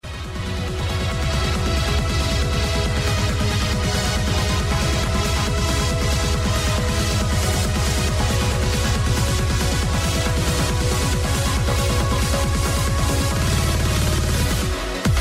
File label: trance, ringtones electronic